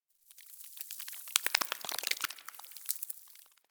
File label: Spine Move Close; Ambience; Misc Outdoor; Warframe; Infested Eris